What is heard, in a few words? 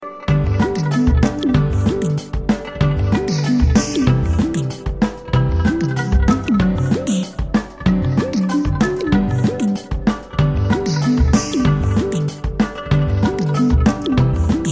jingle bells remix